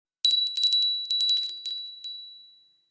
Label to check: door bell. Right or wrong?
Right